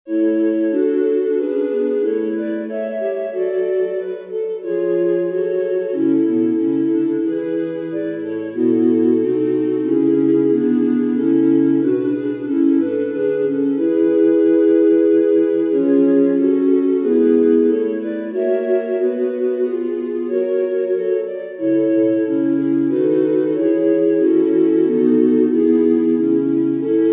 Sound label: Instrumental; Bach; St John Passion